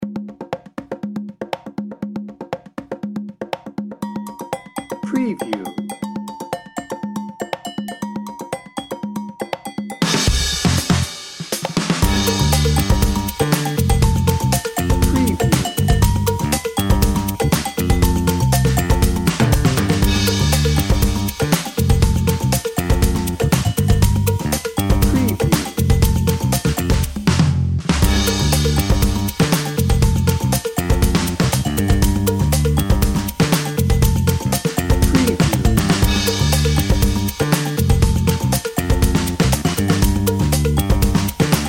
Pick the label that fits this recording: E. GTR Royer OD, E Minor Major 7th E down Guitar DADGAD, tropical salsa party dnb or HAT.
tropical salsa party dnb